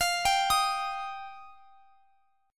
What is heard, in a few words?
F 7th Suspended 2nd F down